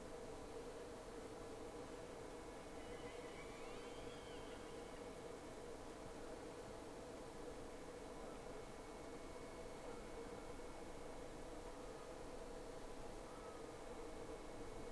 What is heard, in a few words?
Whales; SeaSnake